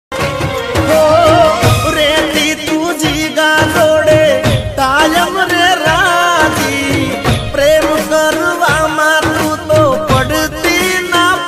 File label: love ringtone gujarati new song gujarati ringtone gujarati instrument ringtone